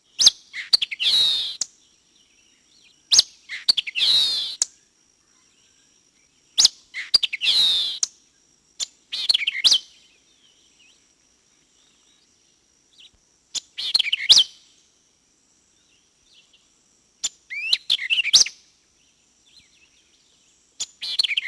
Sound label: white-eyed vireo, bird sounds calls